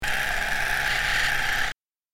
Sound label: Camera Servo Motor; servos